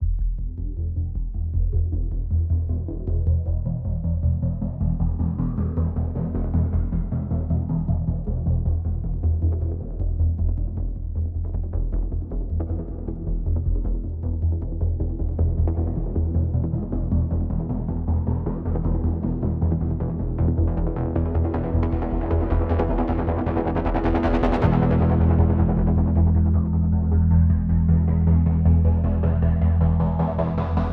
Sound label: Bass 156; Aurora Borealis